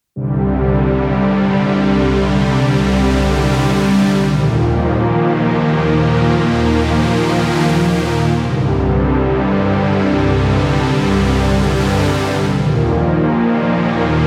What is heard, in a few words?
sweep pad; v-combo vr-09